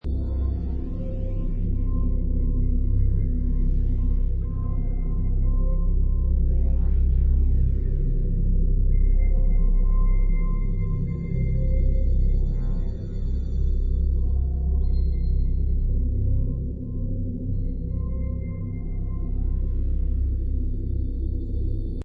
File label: Alien Ship Interior Loop, Sci-fi Ambience, onboard strange ship; space station or alien research lab